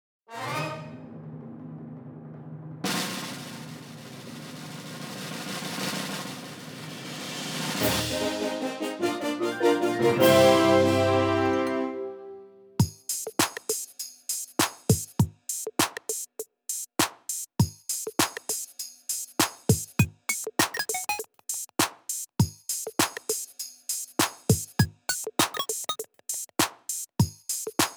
Common BGM

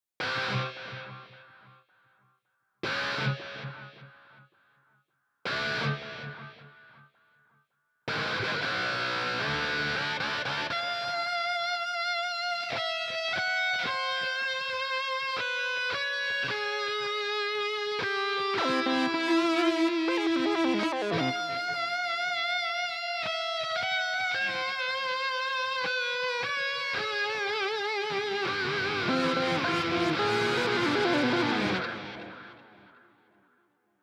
Leads

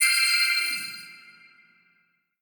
UI In Game Object Gain; Faery Legends of Avalon

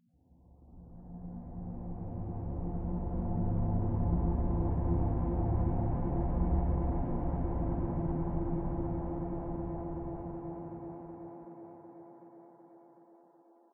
Diving Music Sweep Pad

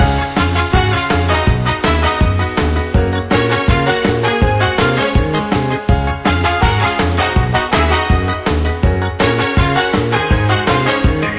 bgm raid box gacha loop

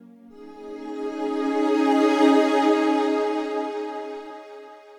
Pad; Dr Watson Pad; Proteus

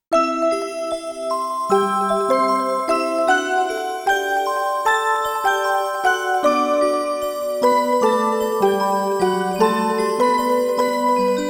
Music Box; KID BGM Collection Vol.1; Tentama 2wins BGM Collection

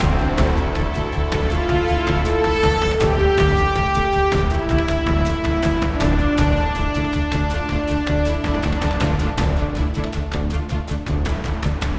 Mood High

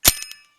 sound Pickup Cog; freedom fall

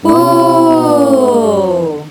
Whoow

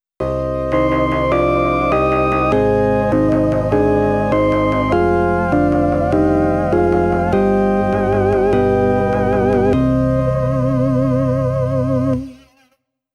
GAME OVER; スプラッターハウス PART2; Namco Bandai Games

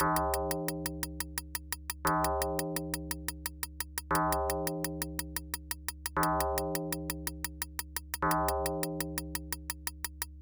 Clock; The NewZealand Story; Genesis

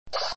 Spray Water; Super Mario 63

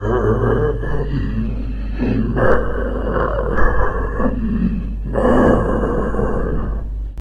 Zombie walk; guns n zombies 2014 pc

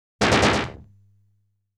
Sound of Boss Stopping Movement

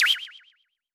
Common Notice; Pac-Man Championship Edition 2 Plus

